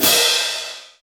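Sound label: Cymbal Hand, Roland R-8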